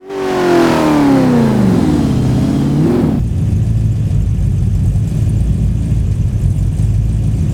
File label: throttle off, vehicles; f150 81